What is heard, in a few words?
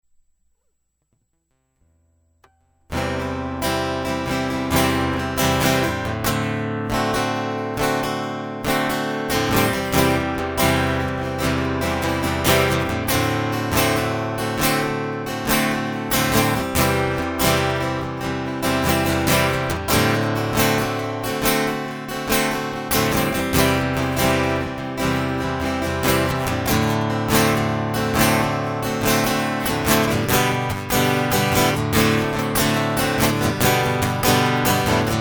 Flowering Guitar